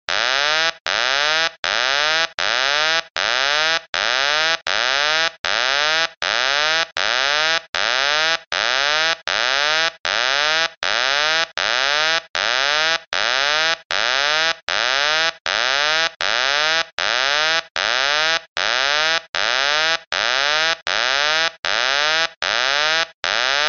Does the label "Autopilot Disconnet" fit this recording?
Yes